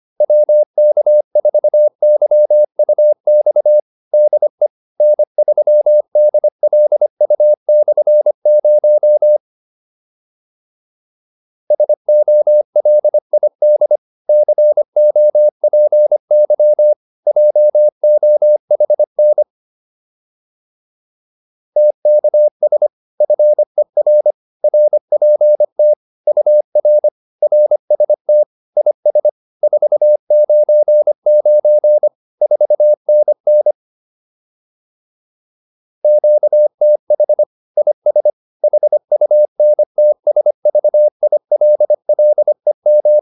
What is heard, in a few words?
QSO, intermediate practice files